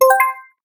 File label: engage; carrot; selfdrive